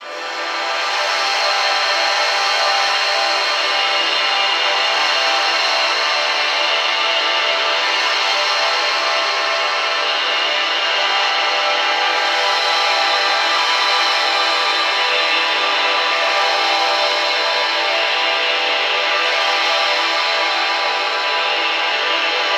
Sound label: Dark Atmo; Total Khéops; Dark Atmo And Digital Glitch Vol.2